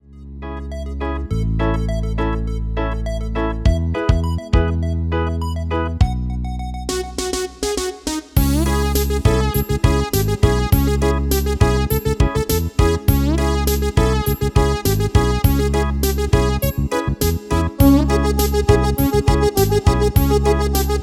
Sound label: MIDI